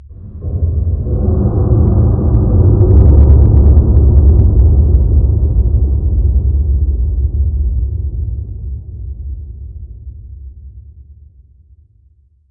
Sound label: amb boomer, random abstract; ambient; Portal 2